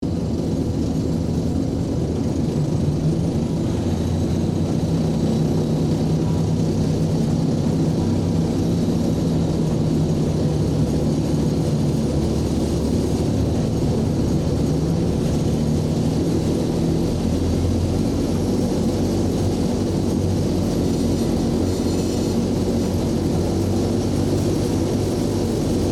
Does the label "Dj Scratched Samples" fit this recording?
No